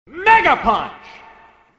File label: punch